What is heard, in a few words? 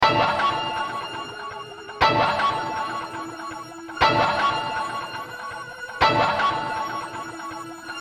Sample loops